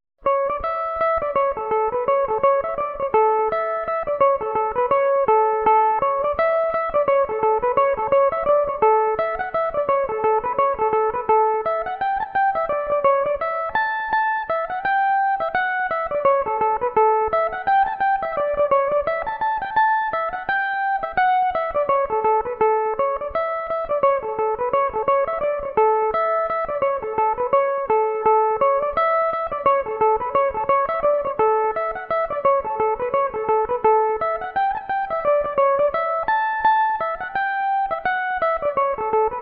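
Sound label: reel A mixolydian, irish mandolin, tunes; grannys rare reel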